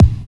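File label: jungle power kick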